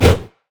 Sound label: great wraith basic attack; monsters; LoL